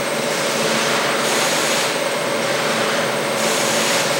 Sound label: machine, ambient; nuke